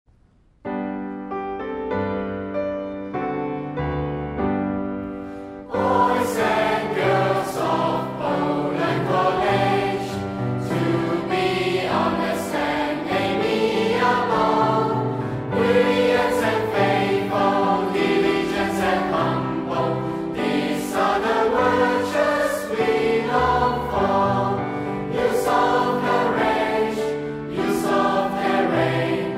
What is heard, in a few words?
school song eng vocal